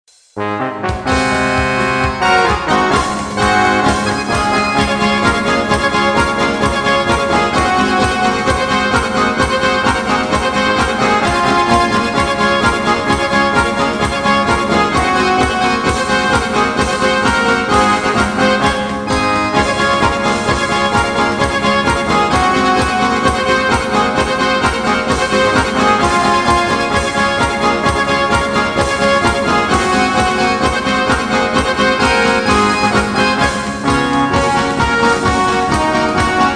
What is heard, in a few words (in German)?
Trompeten Echo